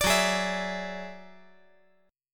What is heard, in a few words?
F sharp 7th Suspended 2nd Sharp 5th; strummed; JGuitar